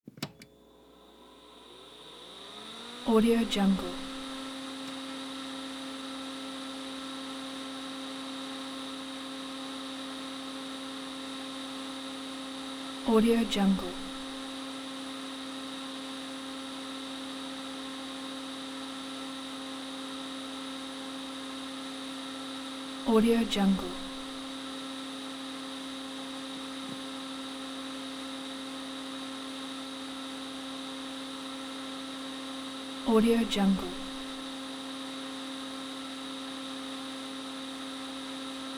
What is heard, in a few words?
vhs deck power up electrical whir and hum